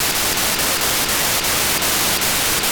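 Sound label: TU100 435MHz450 9k6 FSK, satellite; On September 8th 2023 around 10:15 UTC Daniel DL7NDR received on 435.450 MHz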